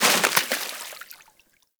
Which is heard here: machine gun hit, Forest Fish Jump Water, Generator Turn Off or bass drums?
Forest Fish Jump Water